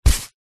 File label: hat sombrero put on head